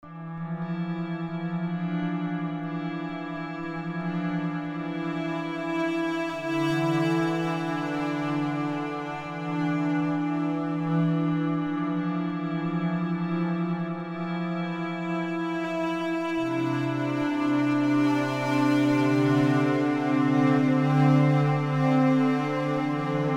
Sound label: strings, ambient